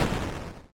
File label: explode